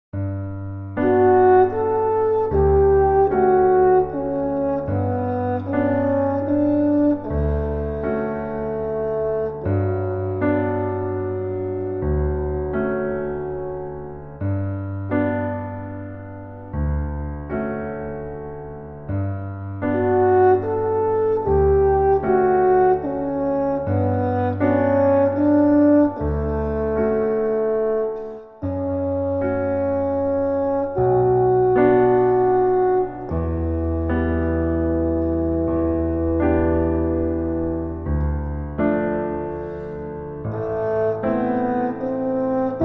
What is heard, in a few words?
Horn Full